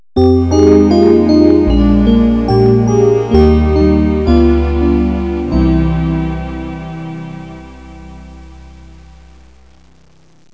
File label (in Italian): musica newage